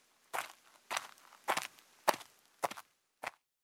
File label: Footsteps; Microsoft Studios; Age of Empires III - Complete Collection; cinematics